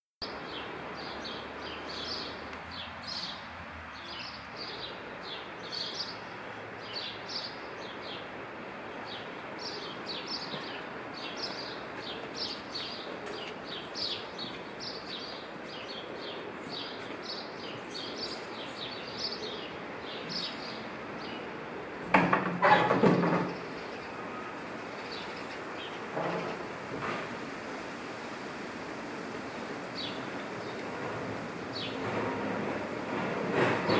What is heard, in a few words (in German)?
Wolken (Original Video Ton), Fieldrecording